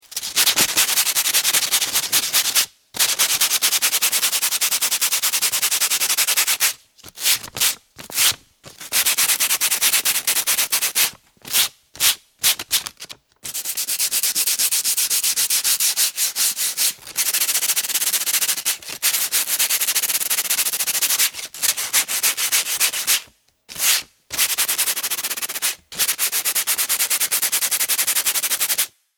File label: Sandpaper, Sanding down a surface with sandpaper.; Rustling; Objects